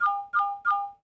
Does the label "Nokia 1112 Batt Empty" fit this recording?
Yes